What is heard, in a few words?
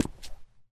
concrete, Footsteps; zps; Foley